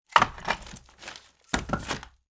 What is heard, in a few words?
wood logs; nature